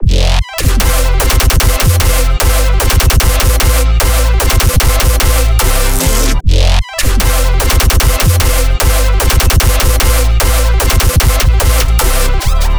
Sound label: bassloop In Your Face C Maj 150; base pack